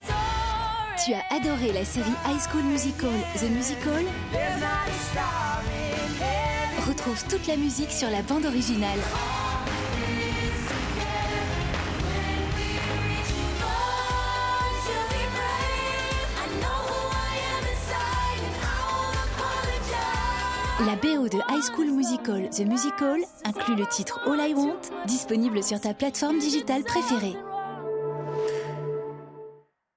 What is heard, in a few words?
Music; HSMTM